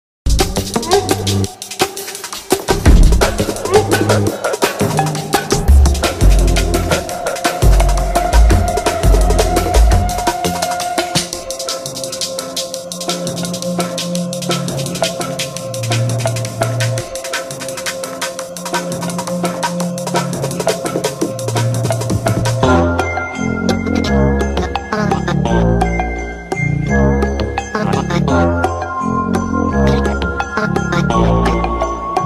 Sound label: Main Theme (Drum & Bass); Utopia; Utopia Soundtrack